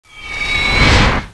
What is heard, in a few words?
bunker buster whistling